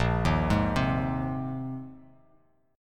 A sharp 9th Suspended 4th A sharp, Bass Standard; arpeggiated; 6,6,6,5; chord; JGuitar

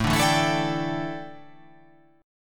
A 7th Suspended 4th, A, down

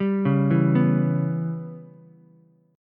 C sharp Minor 7th Flat 5th, Ukulele; arpeggiated; 0,1,0,2; JGuitar

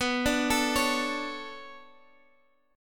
B Minor 9th B Guitar Half Step Down, chord; Bm9

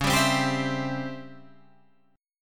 Db Minor Major 7th Flat 5th, Guitar, Drop D

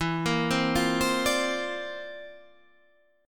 C 11th E

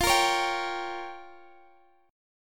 G Minor 7th Flat 5th

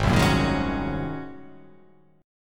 G sharp 11th G sharp, down; strummed; JGuitar

